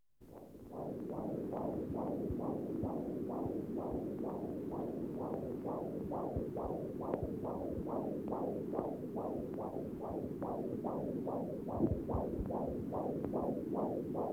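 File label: Foetal heart beat; Hospitals; BBC Sound Effects Library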